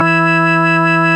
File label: ORG Vox E, Continental Vox Wah Bar; Roland LCDP09 Keys of the 60s and 70s 1